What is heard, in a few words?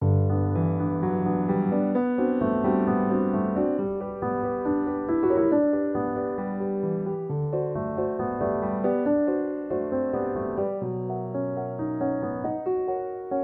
romantic